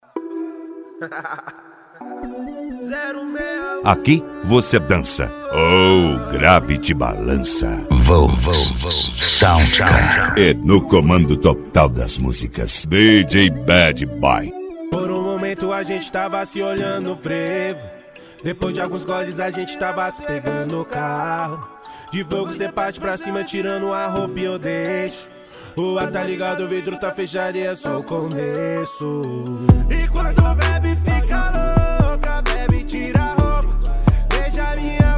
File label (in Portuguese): sound car hip hop nacional; cd volks sound car